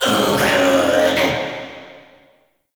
summon vocal verb; Warframe; Uriel; Vythelas

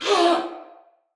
Pain, Male, en; Sinecure Banneret

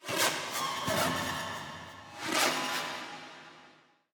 Sentients Enter; Sacrifice Forest Night End; Behind Lotus

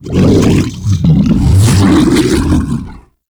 Helminth Feed React Unhappy; Liset Alchemy Lab

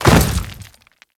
Step Dirt, Footsteps; Warframe; Grineer

